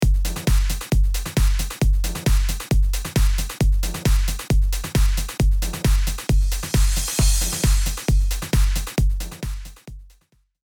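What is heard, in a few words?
reverse crash standard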